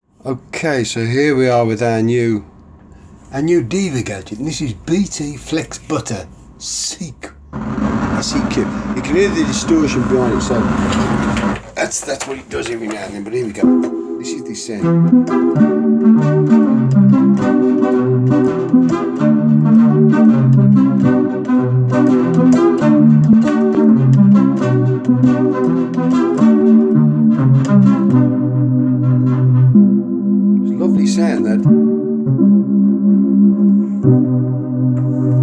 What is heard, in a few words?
Diva Flex Button